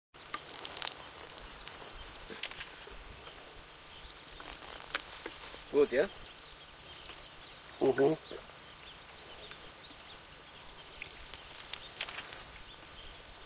Komodo dragons

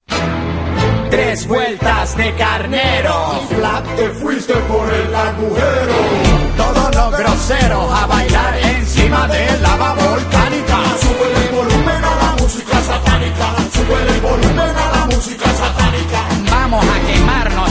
Tango

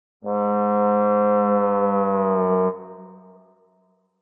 Tired

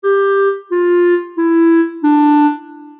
Portato

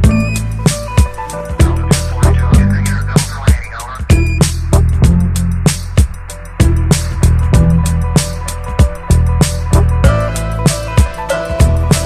Hip Hop Samples

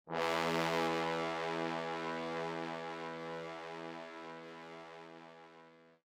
Surprise Shock

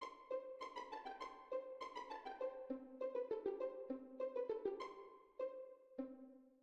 happy

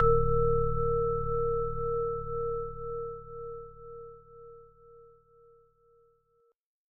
Fear

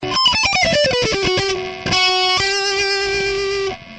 Minisolo

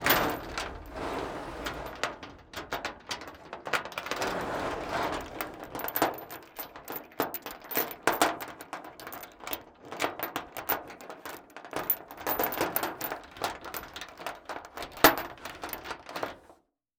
Chain Movement On Metal Variety; Soundopolis; Heavy Metal!

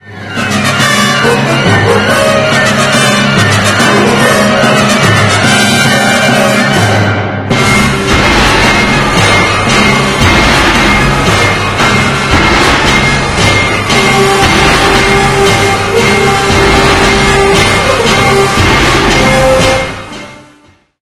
Iron Foundry; Mosolov

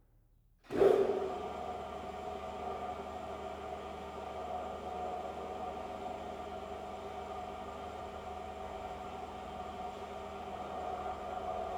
Electric Belt Drive Full Run, Laundry Mangles; Detunized

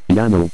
Piano

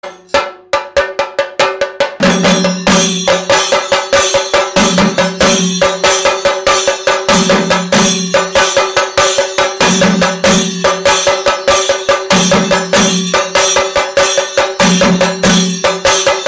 Chenda Melam

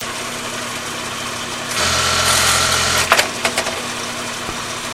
Punch card sound

